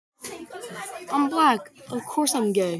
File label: wet big fart